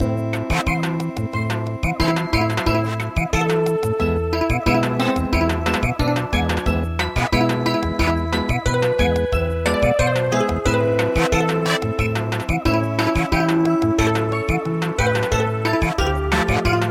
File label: Samba